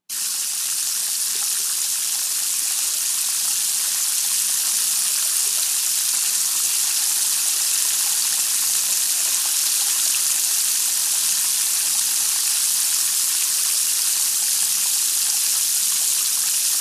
WATER - SPLASHES & SPRAYS HOSE: INT: Close up faucet or shower, drain gurgle in background.